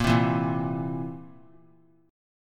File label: Bb Suspended 2nd Flat 5th Bb down; strummed